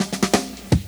DRMROLL; E-Lab; X Static Goldmine 2; akai

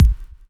BDRUM; E-Lab; X Static Goldmine 2; akai

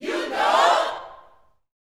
U KNOW, Gospel, SHOUTS; Spectrasonics; Vocal Planet Vol.1 Gospel; akai; UYC SHOUTS

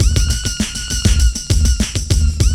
LOOP, DRUM; E-Lab; X Static Goldmine 2; akai

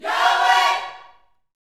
YOUTH CH PHRASE, Vocal Planet Gospel

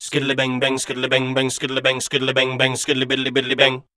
SCAT C

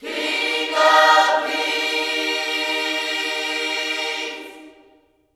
KINGS, Gospel; Spectrasonics; Vocal Planet Vol.1 Gospel; HALLELUJAH

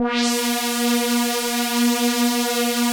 Atmo Pads; Best Service; XX Large - Pads

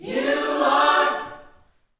Vocal Gospel PHRASE

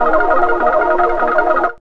WET ARP

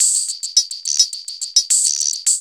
LOOP; E-Lab; X Static Goldmine 2; akai

